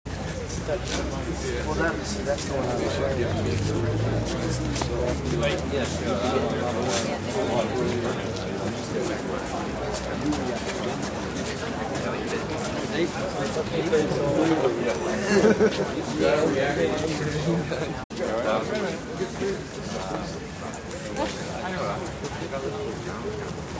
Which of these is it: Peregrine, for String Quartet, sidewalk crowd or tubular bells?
sidewalk crowd